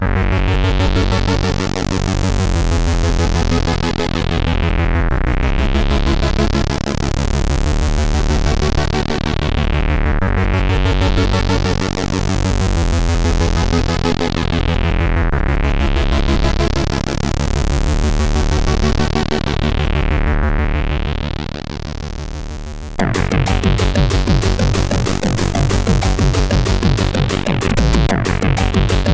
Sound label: CSG 8580R5; High Flight